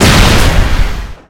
Weapons Shock Rifle

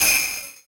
Bell tree; puchicon; dsi ds

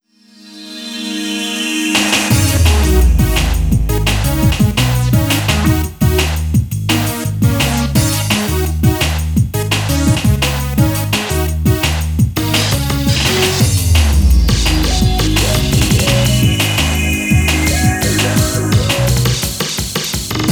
Underwater (With Intro)

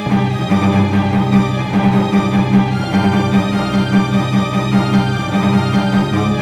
string ostinato; yager windows xbox gamerip 2003

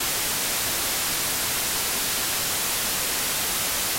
static

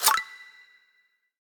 Squeezer Part Tween Complete

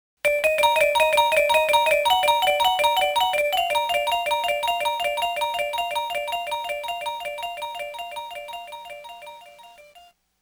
Unknow Jingle; X-Men vs. Street Fighter

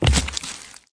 Footsteps

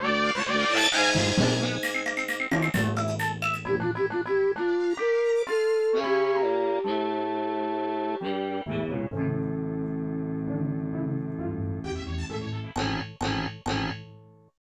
Unused Jingle; pac man 2 the new adventures 1994 snes

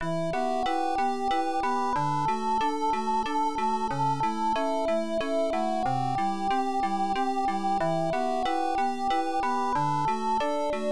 BGM; Tokyo Twilight Busters Kindan no Ikenie Teito Jigoku Hen; PC-98

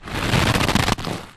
Rope; Zenonzard

